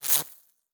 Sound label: Spark, Electric